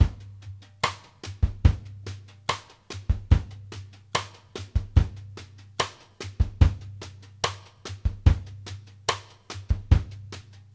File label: BRUSH + CLIC, BALLAD 72; Sampleheads; New York City Drumworks VOL-1; SP BALLAD 72